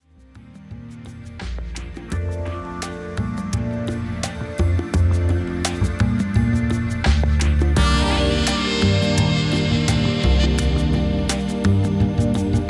Jazzy Lounge Mix

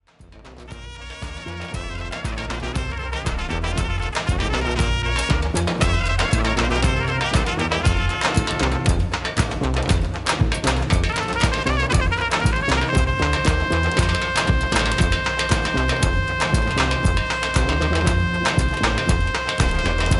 Wedding cocek

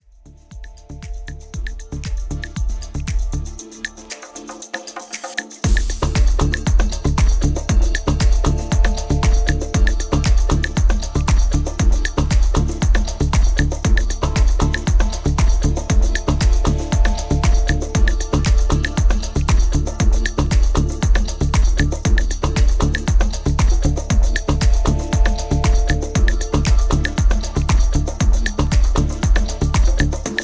Congas

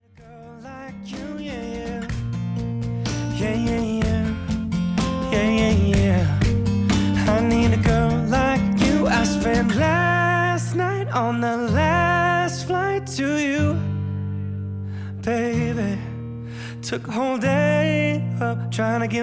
acoustic cover

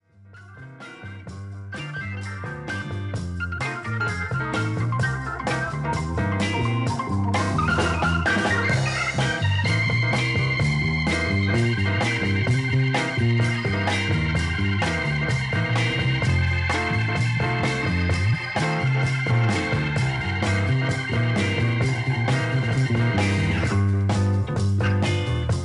Rhythm Blues

